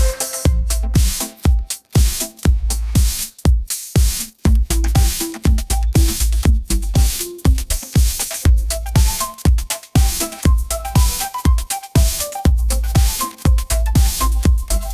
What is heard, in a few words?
upbeat pop melody, ringtones